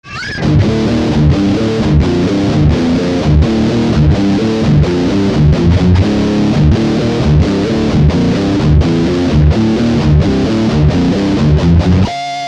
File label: SANS AMP GT2